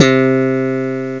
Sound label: Slap Bass